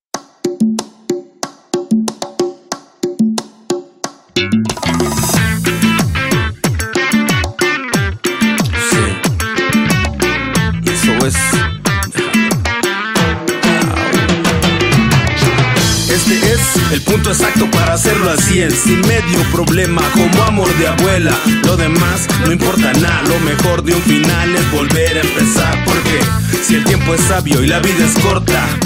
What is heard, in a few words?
vivo fun time ringtone